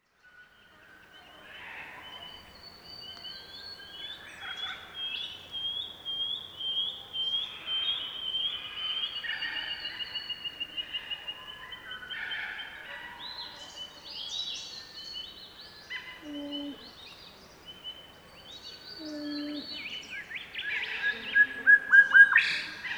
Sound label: Central Victorian Birdsong Chorus